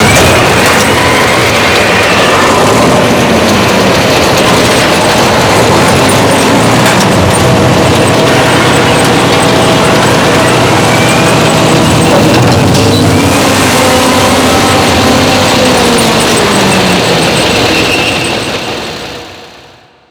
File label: halftrack stuck; vehicle; mohaa